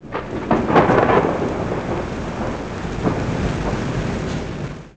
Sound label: rain cloud; The Interactive Alphabet